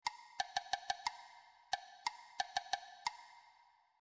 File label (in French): rythme